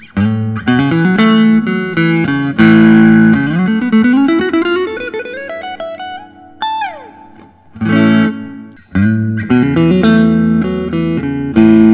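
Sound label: S style electric guitar; lentz; pre lawsuit; faded sonic blue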